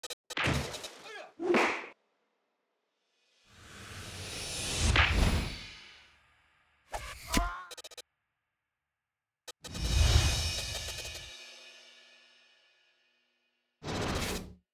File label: SFX; Unsung Hollywood S3; Fred Williamson